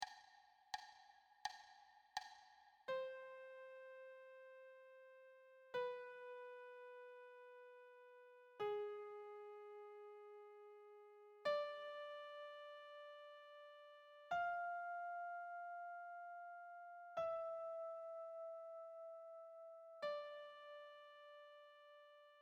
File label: wholenotes solo